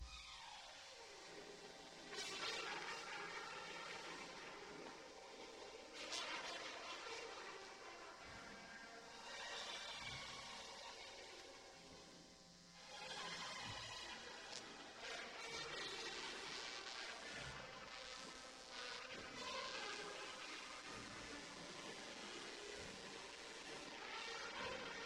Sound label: Tawny Oak Mood; music to sell weyerhaeuser hardwood paneling